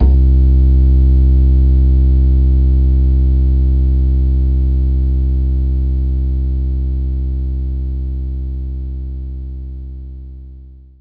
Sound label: acoustic bass C2